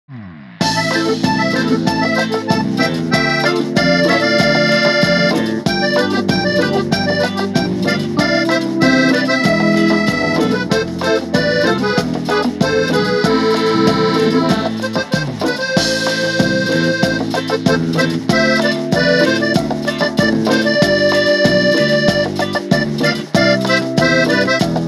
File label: cumbia